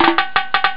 hawaiinetten; Amiga Soundtracker Sample Packs

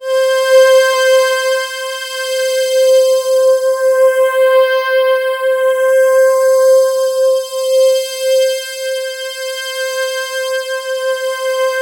C5, Synth Line; 90 s Sample CDs; NiceBeats; SynthLine VOL-1

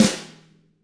DW FLM, SNR; ILIO; Double Platinum Drums 1

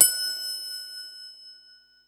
mallets

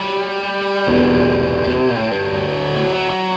Krush, Electric Guitar

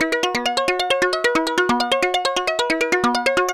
KALIMBA; AMG - Global Trance Mission VOL-2

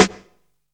KIDDY, snares; Zero-G; Funky Elements

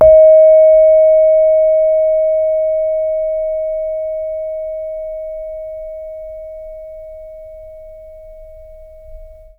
SARON D#2; Spectrasonics; Heart of Asia

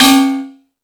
Thundadome, E-MU Pro-Cussion; drum machine

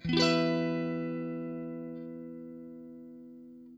CHORD LN M, guitar; Quantum Leap - Guitar & Bass

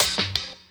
Dance Mega Drums, KIT D+B; Best Service

